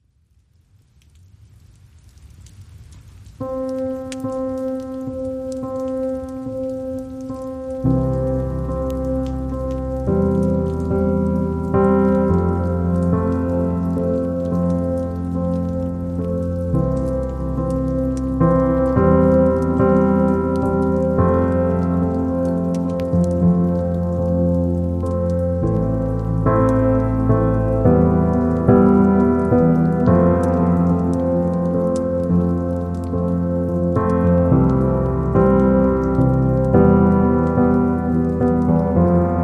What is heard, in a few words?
Calm